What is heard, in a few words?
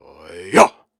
Grunts and Chants, Viking Vocal